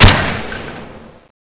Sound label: sound galil; ZP CRISTIAN; ZP WEAPONS; bio III